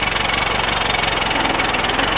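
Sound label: model t idling; laundry truck; sound effects and sound reinforcement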